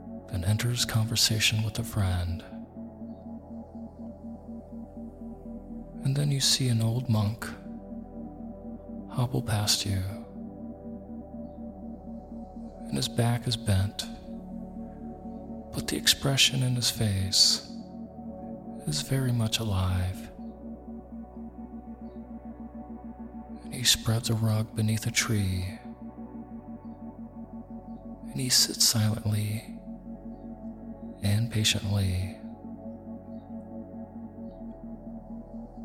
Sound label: Personal Transformation Isochronic Tones